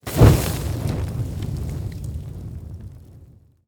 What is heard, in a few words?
Arrow Launch Fire; SteamVR; InteractionSystem